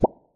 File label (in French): bulle